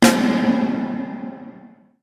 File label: Hall